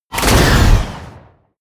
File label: artillery missile truck fire